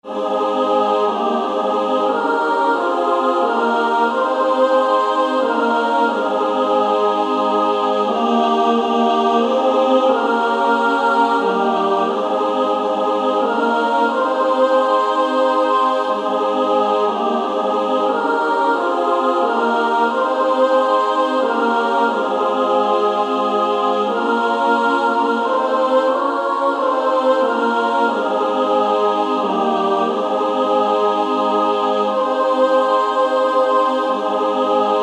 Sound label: Female Choir SSAA